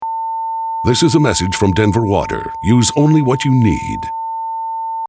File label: Test Tone